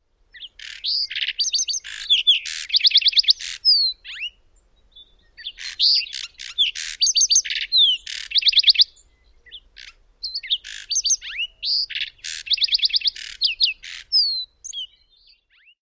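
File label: songs, lark sparrow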